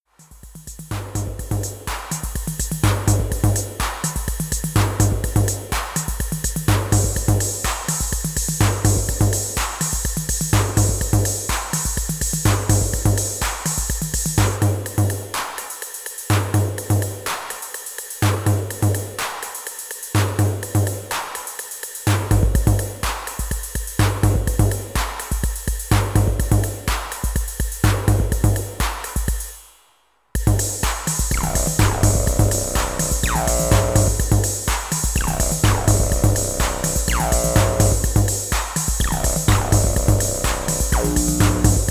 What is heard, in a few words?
808 vs 303 Track